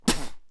spitting

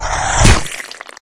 bite hit

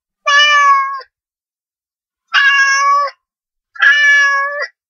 cat meowing

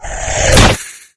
bite miss

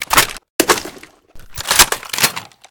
reload, mg; guns

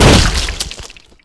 gibbed

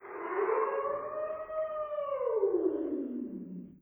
mon wolf, monster

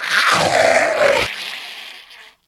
zombie death, monster

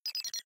bot default, robot

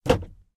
gear shift, vehicle

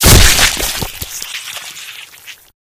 gibbed

